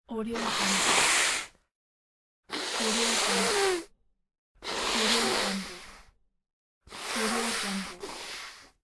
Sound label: nose blowing